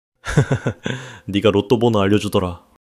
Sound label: laughter